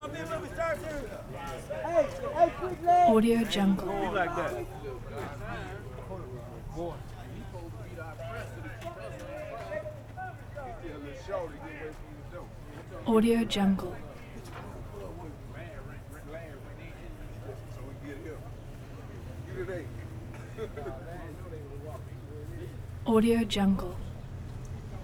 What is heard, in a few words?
prison crowd exterior male inmates walla; prosoundfx